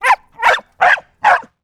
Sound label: Dog Barking; Animals; 1001 Sound Effects